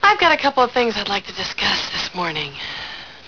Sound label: shepard